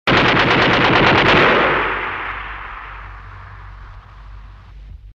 machinegeweer; Sound Effects Deel 2

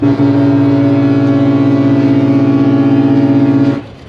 Duits Start Horn; Kermis Horns

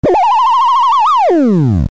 Kermis laser

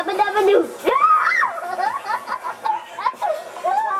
Yabbadadoo Lachend Kind; Kermis Jingles Diversen

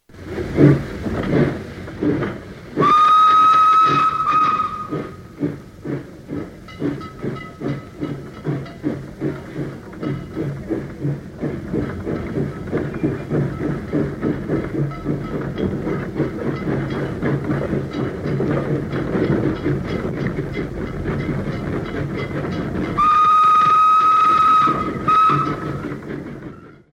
Trein Vertrekt, Treinbaan Geluids Effecten

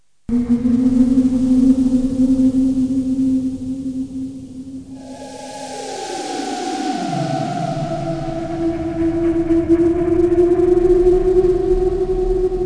Eng geluid, Spookslot Effecten; Spookslot Tunes Effecten

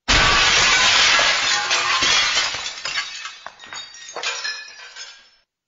Glasbreuken; Sound Effects Deel 1

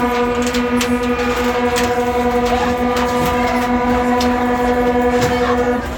Duits Start Horn; Kermis Horns

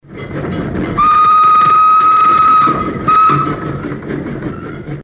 Bello, Treinbaan Geluids Effecten

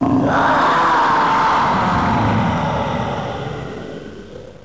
Schreeuw; Spookslot Tunes Effecten

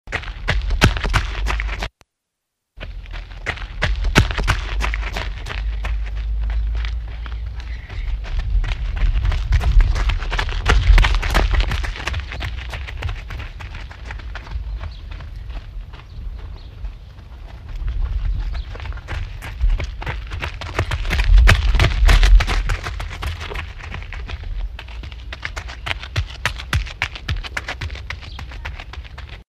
hardlopen; Sound Effects Deel 2

